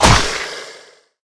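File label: Quick skill Red Slash; Gamigo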